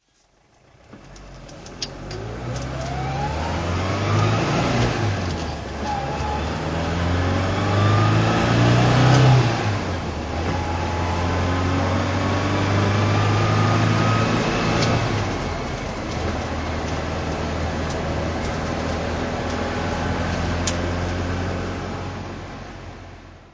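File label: alfa romeo giulia 1600 sprint drive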